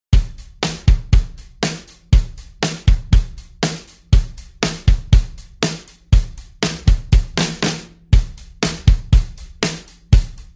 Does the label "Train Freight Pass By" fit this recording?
No